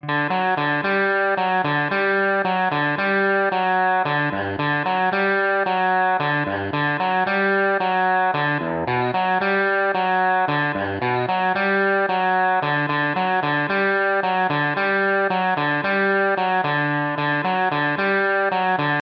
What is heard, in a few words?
Arpeggio; Hysteria Style